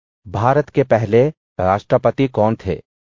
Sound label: techno